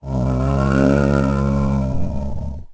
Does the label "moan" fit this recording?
Yes